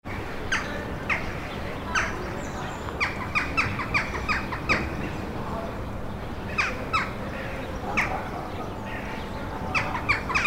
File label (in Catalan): zoo